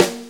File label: Snare C, Snare Drum; Sonic Foundry (Sony Creative Software); Loops & Samples Drum Tools